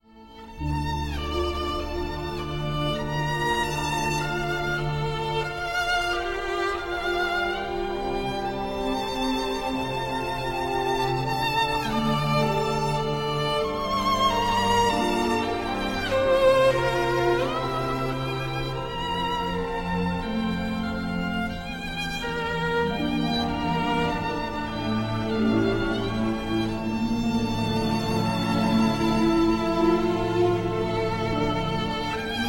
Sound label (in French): Violon; La Liste de Schindler